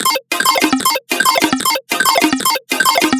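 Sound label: synth loop 8bit asmr 150 F minor; Virtual Riot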